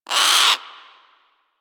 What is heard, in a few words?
Sea Bird Tranq Dart Grunt; Warframe; Hunting Critters; Ostron Sea Bird